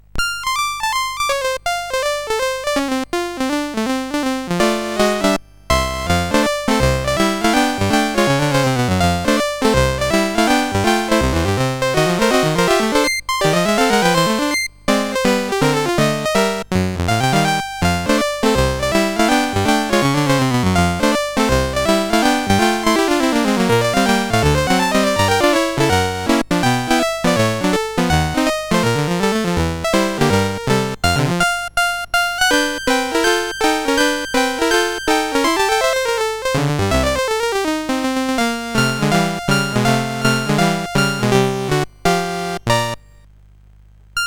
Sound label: Racket, CSG8580R5